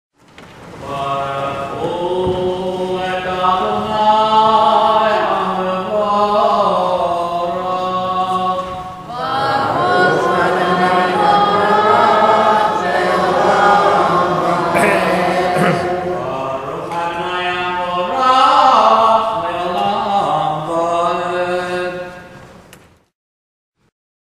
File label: Barchu